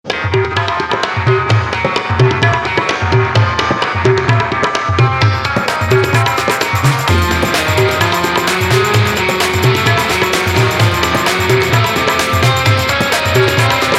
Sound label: arabic night